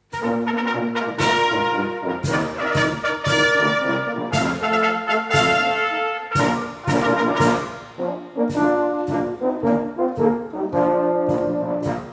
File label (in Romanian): Fanfara